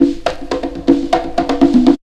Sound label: Percussion; AMG - Coldcut's Kleptomania VOL-1